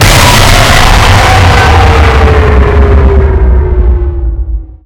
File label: loud asmr